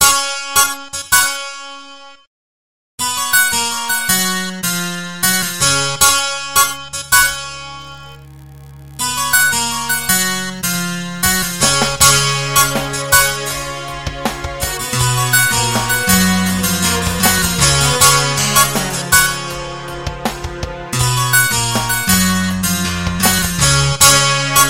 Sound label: Acoustic Twelve String Guitar; GuitarTempus; Syntheway